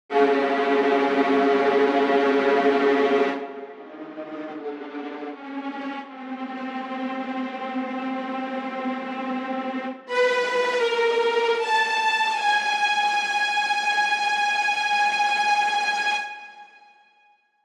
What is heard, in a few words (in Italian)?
VIOLE tremolo